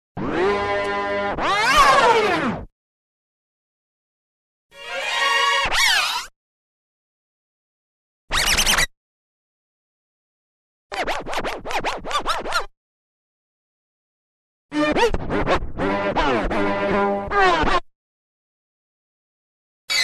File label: Record Scratch